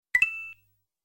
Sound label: star coin; super mario